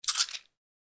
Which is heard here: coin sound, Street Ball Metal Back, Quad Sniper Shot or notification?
coin sound